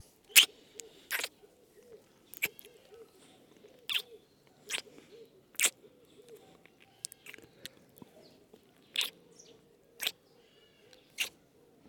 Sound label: Kissing